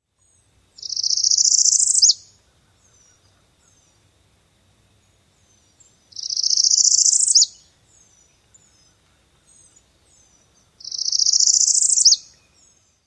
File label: typical songs